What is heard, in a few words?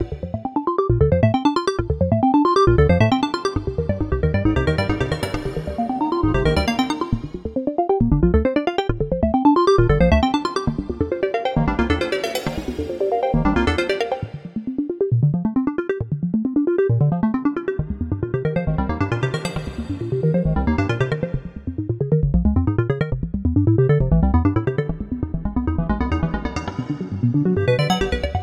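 135 arp B flat major; artemis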